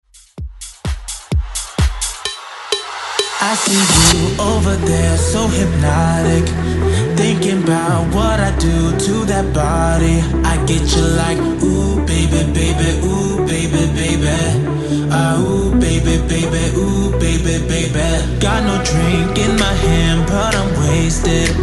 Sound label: Future House